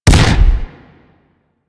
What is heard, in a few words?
Double Barrel Shotgun Fire Shot; Weapons